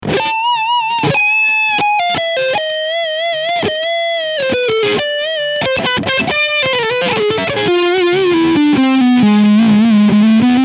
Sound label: Strandberg Boden Fusion Leadsound Front Pickup